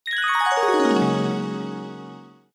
片头音乐

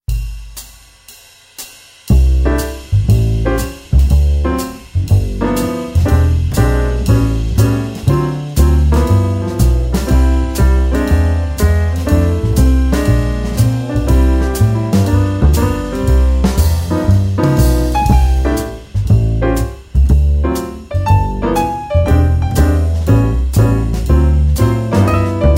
专题片背景音乐

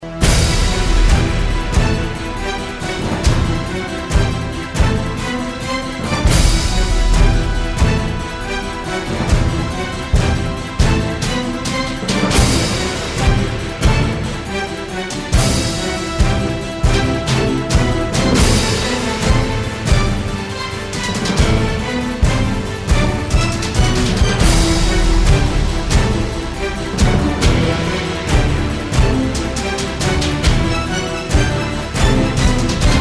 激情的背景音乐

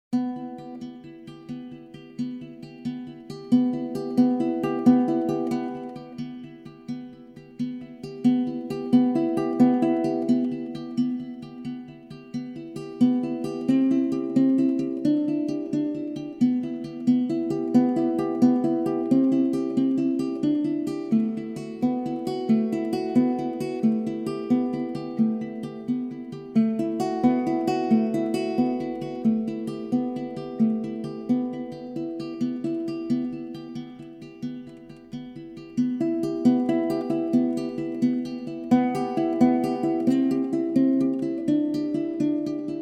广告片音乐

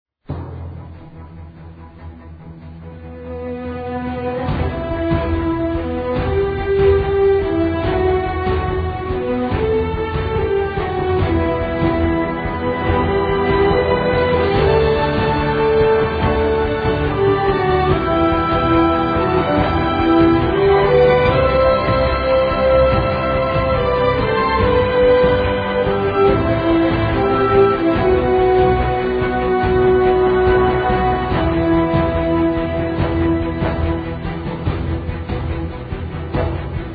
专题片背景音乐

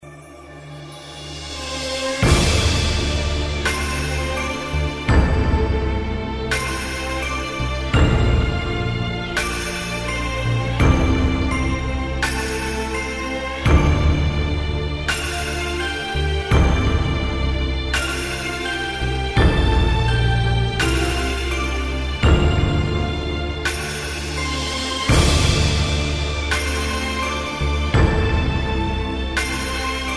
大气的背景音乐

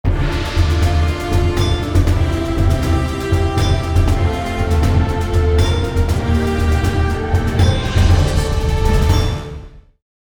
大气的背景音乐

